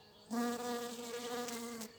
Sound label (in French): Abeille se pose net et clair; Vols Bourdn abeilles